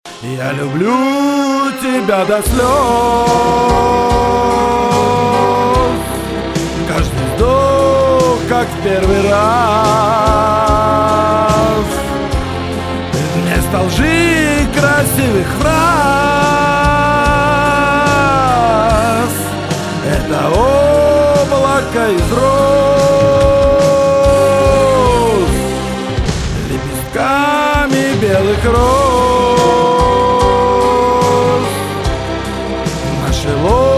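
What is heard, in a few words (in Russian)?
До тюнинга вокала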